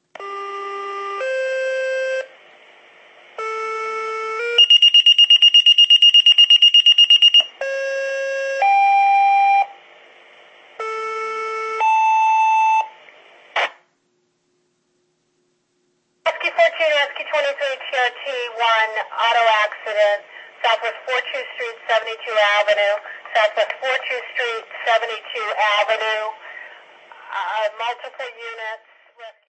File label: minitor 3 alerting for auto accident